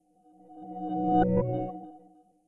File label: Shutdown; Windows NT 9.0 Shutdown; Windows Never Released Soundpack 1